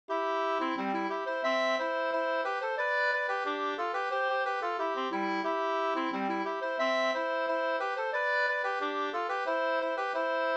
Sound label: polka